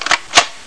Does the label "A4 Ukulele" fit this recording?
No